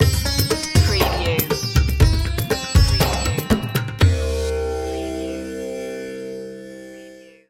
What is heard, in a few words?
techno india stinger